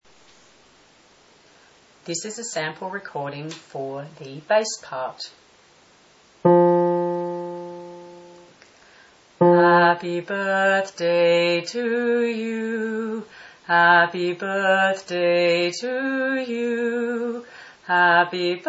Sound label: Sample Bass, VOCAL PART SAMPLES